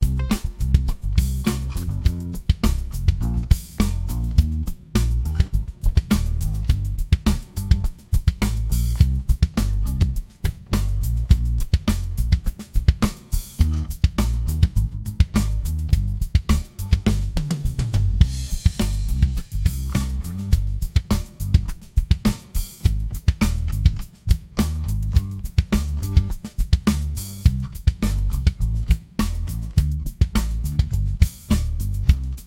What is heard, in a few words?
breakbeat boogie